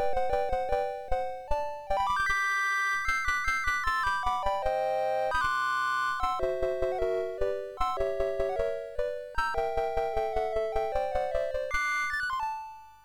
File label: Season Jingles, MOS6581R3